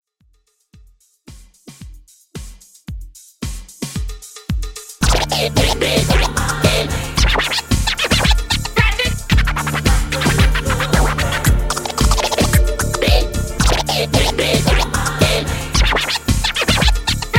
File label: Dj Scratch Intro Outro, 112Bpm